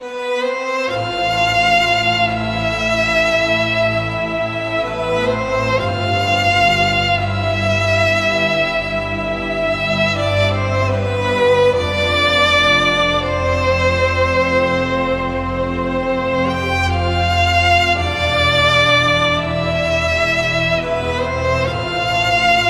sad emotional violin music

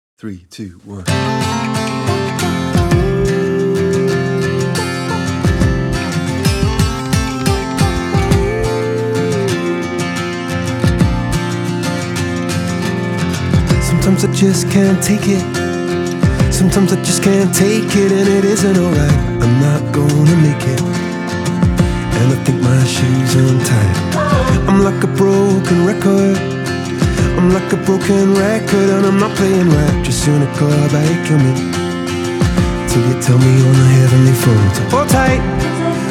acoustic version

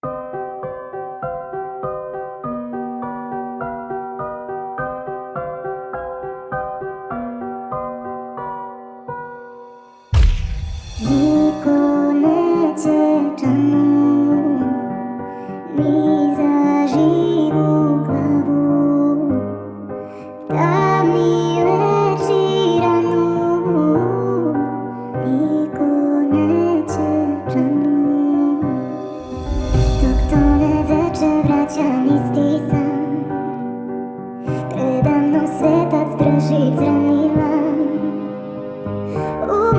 sped up reverb

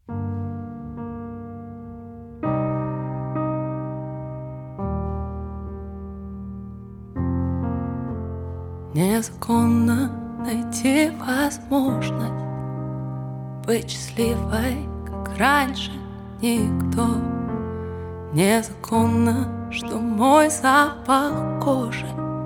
acoustic version

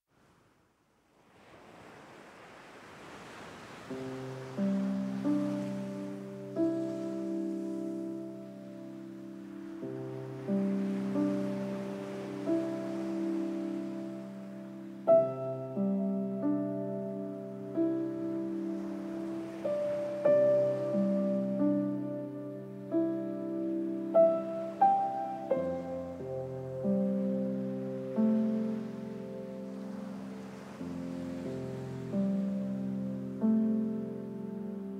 piano

